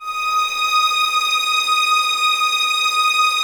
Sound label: Violins D#6, String Ensembles; Light; Miroslav Vitous; 11 Violins